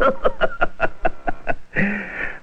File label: laugh lucifer